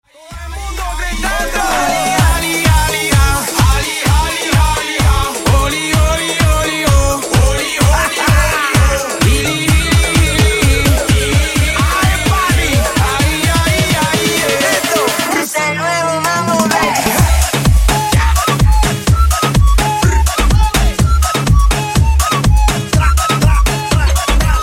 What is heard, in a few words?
Mambo Redrum 128 110 Bpm; Tranny